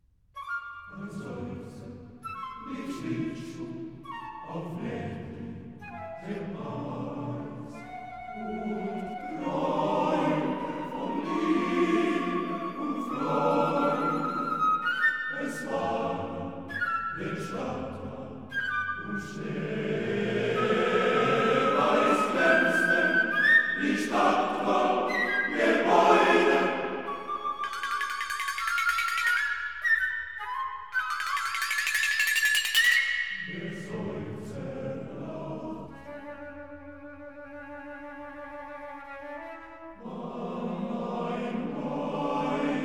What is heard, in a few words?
Male Voice Choir; Bim Bam Bum Op80 II Der Seufzer